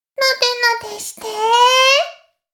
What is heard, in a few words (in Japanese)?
妹 しこしこ音声; 妹めしこしこ音声